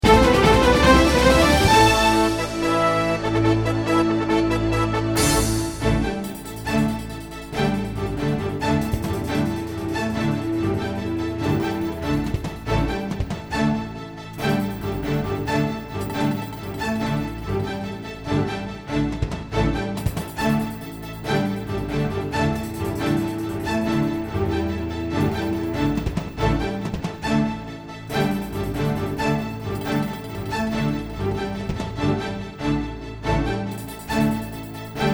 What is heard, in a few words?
News Orch; Corporate